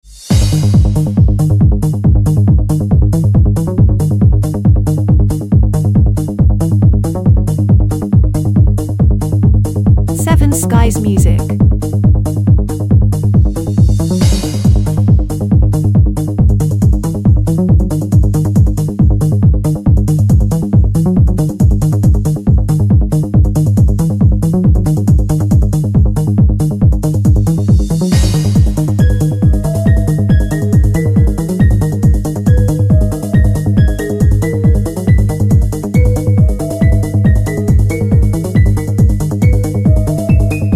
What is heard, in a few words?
Techno